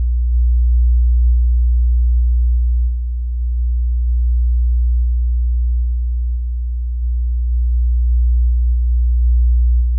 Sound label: Hum Simulator